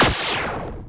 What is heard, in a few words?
weapon cannon